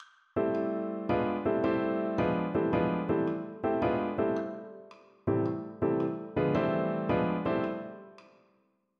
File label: jazz comping techniques, piano